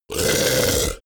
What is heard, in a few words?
beast choke short